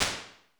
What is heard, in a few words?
Wooden Studio, impulse responses, small spaces, rooms; Apple